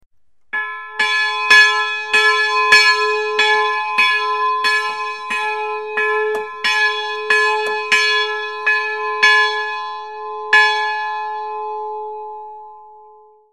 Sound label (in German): Glocke kurz